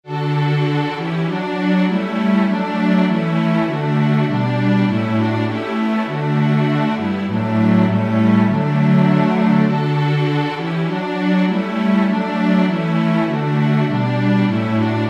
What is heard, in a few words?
Deck the Hall; partitur